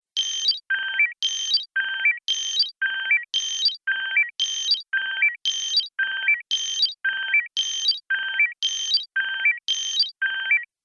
Incoming Signal, transition sound effects

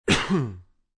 Human, Coughing, Male; Sick

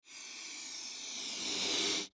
Party Balloon Blow Up Single Breath; Foley

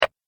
Impact Hit Clonk; Miscellaneous Impact Sound Effects

